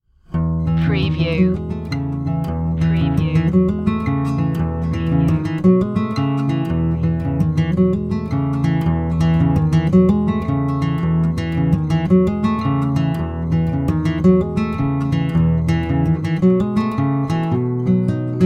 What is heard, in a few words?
acoustic guitar solo